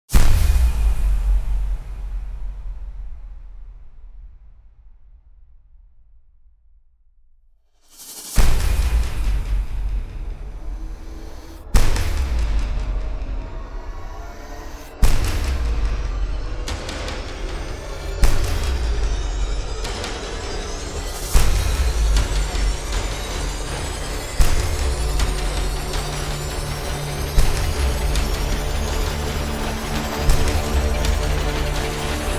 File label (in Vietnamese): nhac nen su thi gay soc su xuat hien nhan vat sap toi